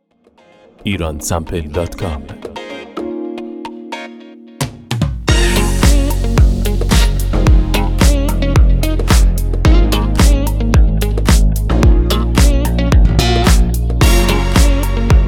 Hits, instrument hits

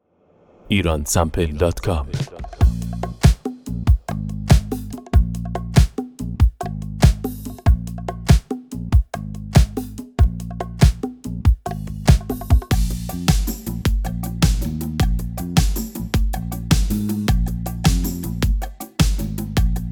6 8 nostalgia modern, persian

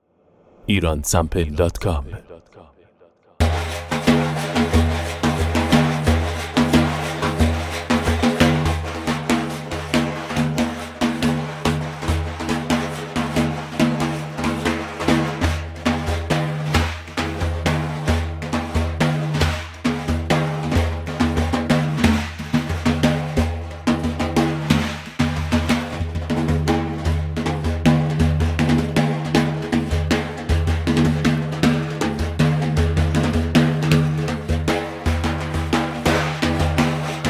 daff

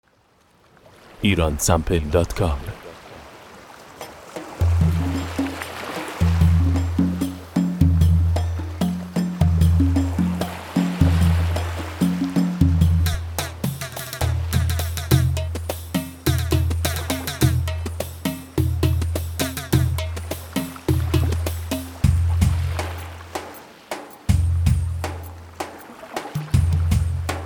khaliji

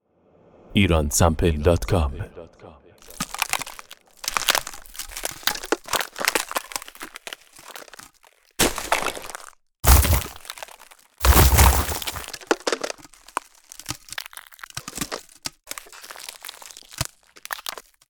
Ice; Iransample

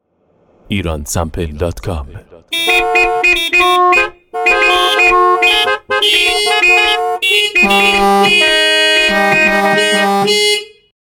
car horn; Iransample

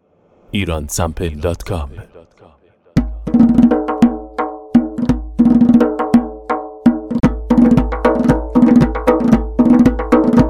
Tombak

